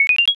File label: score up